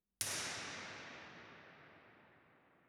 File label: IR sweep; IMP-RESP